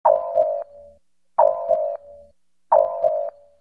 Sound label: rom alarm; Star Trek Deep Space Nine - Entertainment Utility